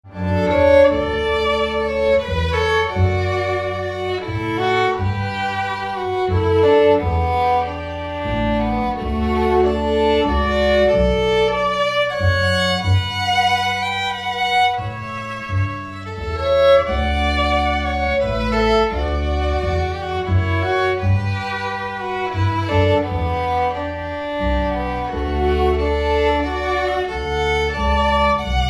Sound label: Classical String Quartet